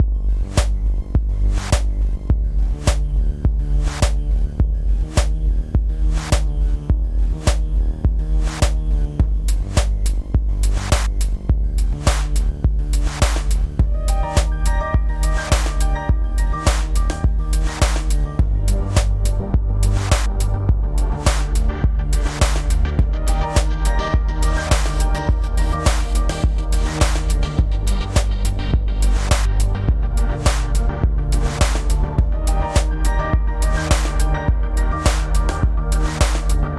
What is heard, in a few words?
Mirrors Beat